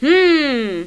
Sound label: hmm